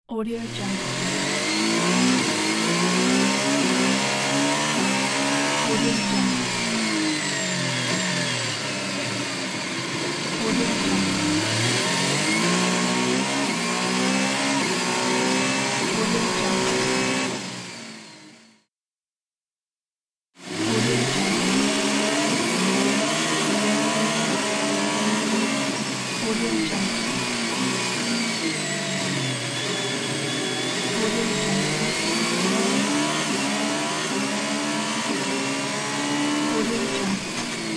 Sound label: sound inside racing car